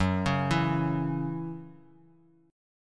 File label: Db Minor Double Flat 5th Gb